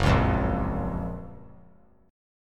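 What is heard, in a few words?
G flat Major 7th G flat; GbM7 strummed; JGuitar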